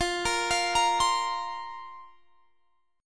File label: F Suspended 4th F; Fsus4; arpeggiated; x,20,20,22,23,20